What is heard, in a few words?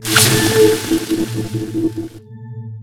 invul activate